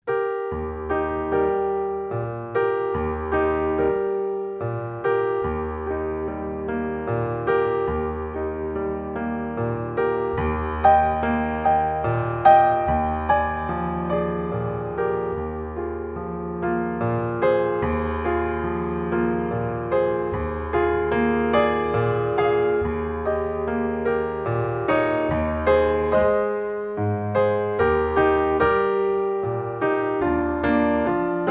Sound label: wiegenlied lullaby